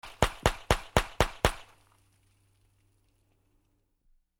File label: Flare Gun Shots